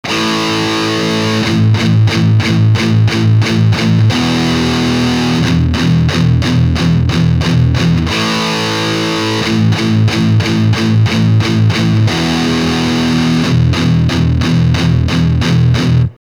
palm muting, guitar

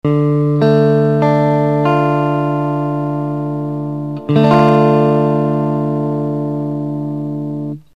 d minor, guitar

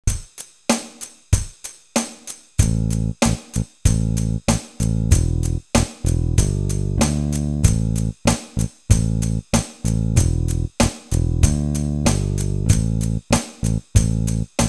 palm muting backing, guitars

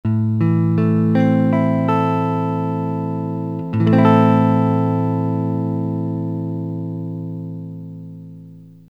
a minor, guitar

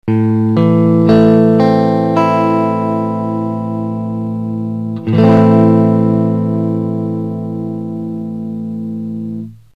a minor, guitar

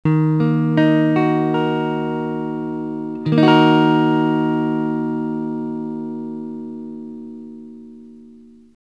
d sharp minor, guitar

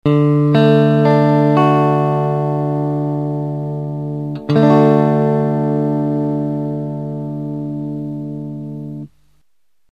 d major, guitar

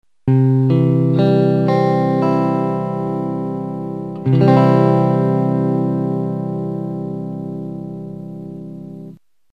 c major, guitar

